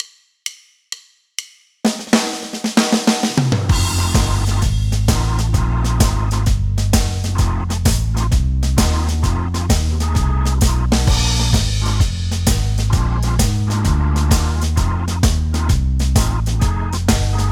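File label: blues in F, triplet rhythm, with harmony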